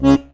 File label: HOHNER, AKKORDEON